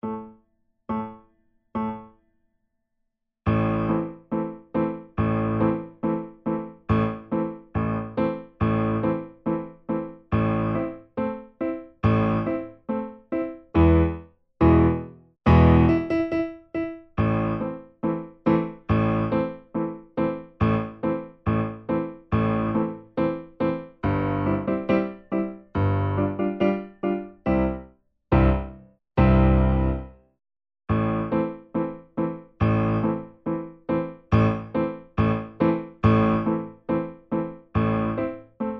Secondo, Vierhaendige Uebungsstuecke; Diabelli

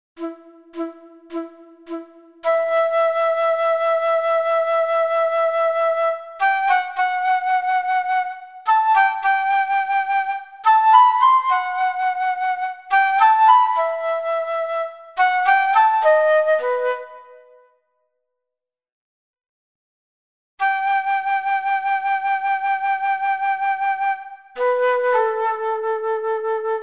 Sonate E-Moll Adagio Floete; Haendel; Hallenser Nr2

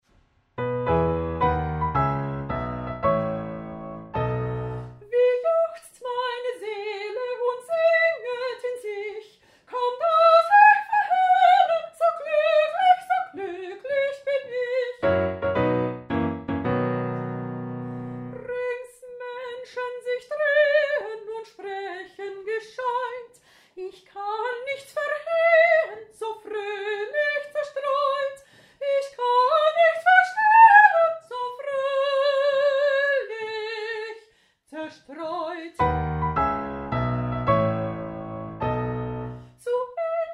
Playback Gesang; Playbacks; Glueck; Koessler

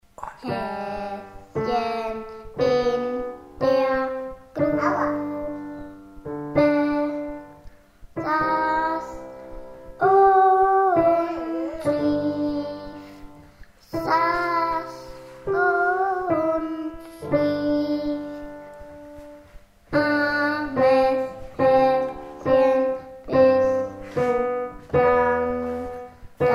Gesang Klavier; Haeschen In Der Grupe

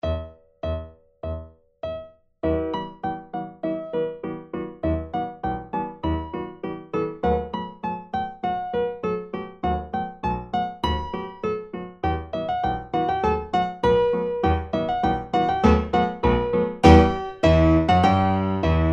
beide, Vierhaendige Uebungsstuecke; Playbacks; Diabelli

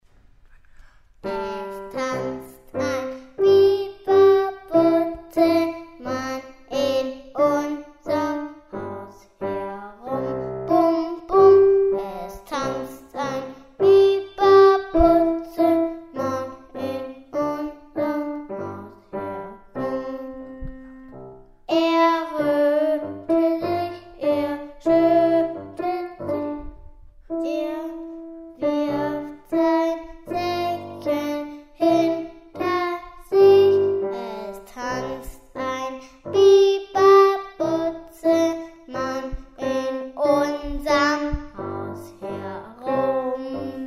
Es Tanzt Ein Bi Ba Butzemann Mit Klavier

